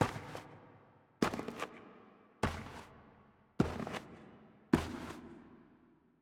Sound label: snow footstep